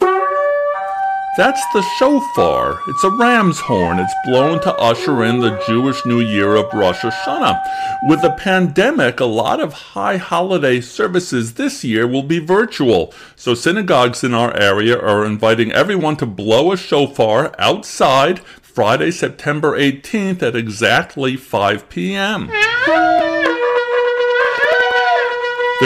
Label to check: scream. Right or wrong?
Wrong